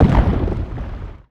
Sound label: wild Appear After Explosion; netent